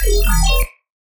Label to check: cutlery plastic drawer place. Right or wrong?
Wrong